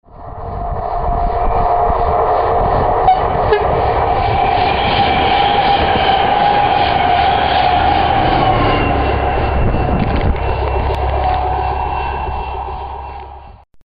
horn

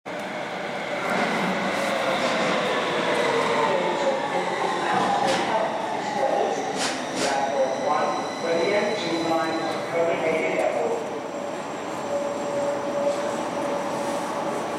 Metro G1 Arrive Tunnel, Metro G1 ankomst, Tunnel; Emil Glentvor, Oktober 2022, Metro

